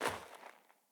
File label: Sandals Land Snow, footsteps; Player